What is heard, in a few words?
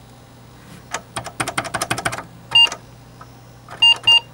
Apple II keys and beeps